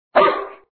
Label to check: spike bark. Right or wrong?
Right